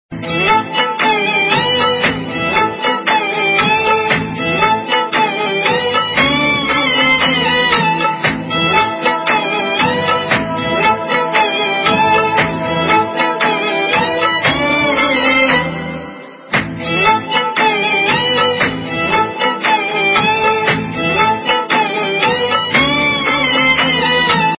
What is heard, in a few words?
Pakistani Ringtones